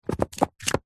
foley raffle ticket books wads set down on top each other; zapsplat